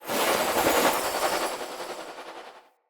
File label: Casting Device Projectile Whizz by; Weapons; Player; Galene